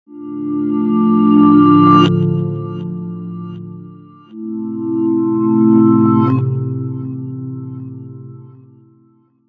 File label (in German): Default Unbearbeitet